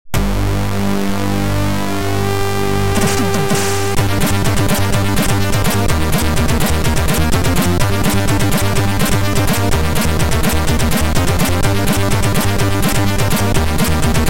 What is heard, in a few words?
Flight level music; Metal Warrior 3; Hideaway; Metal Warrior Trilogy